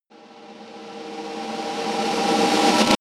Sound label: reverse hit drum roll